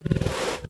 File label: Tame Interact Stand Scratch Sniff; Enemies; Warframe